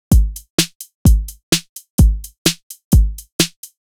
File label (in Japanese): ハット